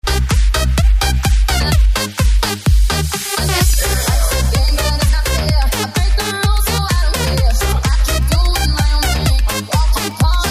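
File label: ringtone mobile; زنگ موبایل ساده و شیک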